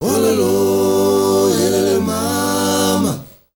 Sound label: MALE GRP, CHOIRS; Spectrasonics; Heart of Africa 2